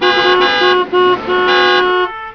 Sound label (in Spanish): oceano